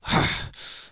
daemon attack; propack

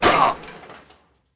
catapult die; propack

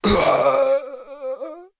guard die; propack

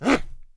horseman attack; propack

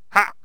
stickfighter attack; propack

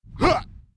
guard attack; propack

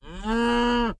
cow die; propack

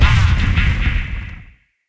tower hit; propack

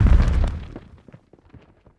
catapult hit; propack

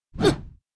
swordman attack; propack

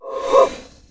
summoner attack; propack

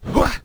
axe thrower attack; propack

